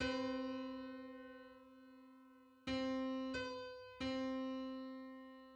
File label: Hundred-thirty-third subharmonic on C